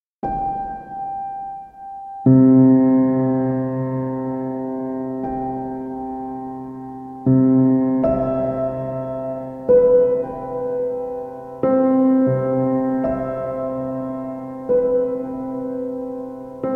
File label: Piano Sounds